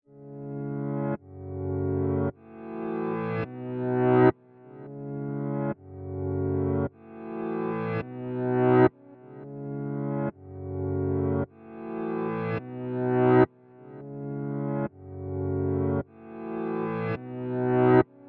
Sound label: Piano Reverse Mellow Dm 105; Sample Banks; Melody; Keyboards